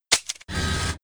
Vending machine Empty

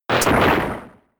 Crunch

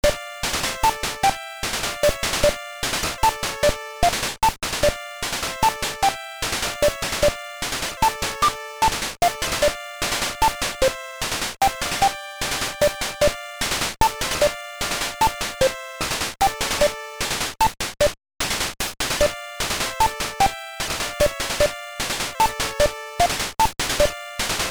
Tavern Temple; Advanced Dungeons & Dragons; Hillsfar; NES

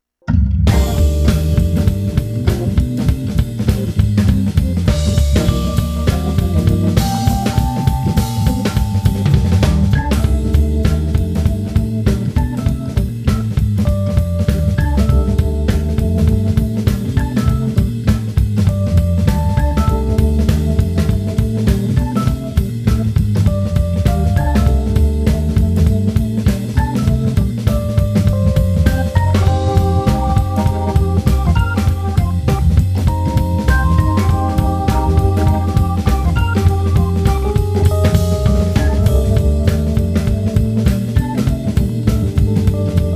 Jazz; Mighty Engine

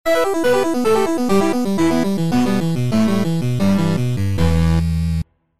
Game Over; The Last Vikings; android

